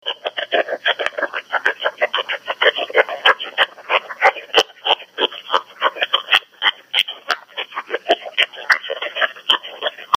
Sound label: NXDN Encrypt; Kenwood NEXEDGE Digital Encryption